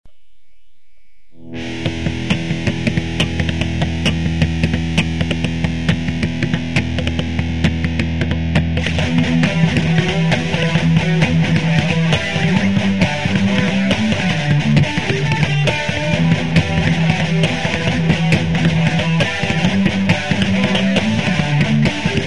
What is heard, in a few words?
only guitar